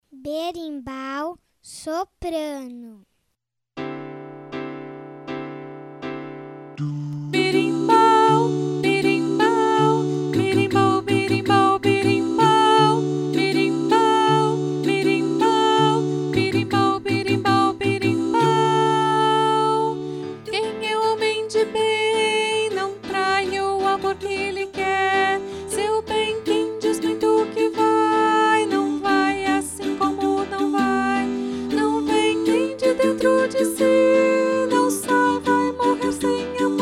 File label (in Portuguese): berimbau naipe soprano; 4 VOZES